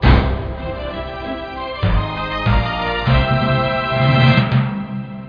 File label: Fanfara; vyuka